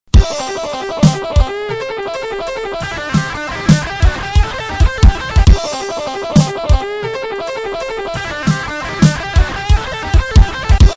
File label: 16th notes, Swing 4/4 time, 180 bpm